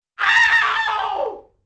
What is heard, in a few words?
high pitched owww